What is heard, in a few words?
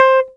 damp GBSN C5, EL.G; AKAI S-Series CD-ROM Sound Library VOL-2